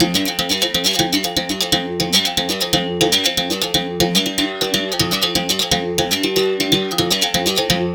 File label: 120 Berim. Samba; Spectrasonics - Supreme Beats - World Dance